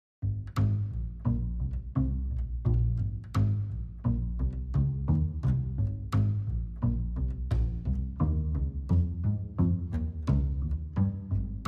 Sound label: Bass Clean